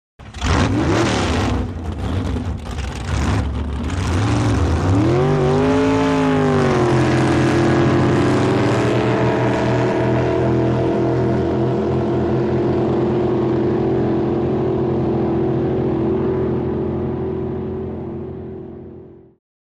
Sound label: Drag Boat Start revs